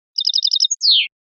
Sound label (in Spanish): terminacion tititichio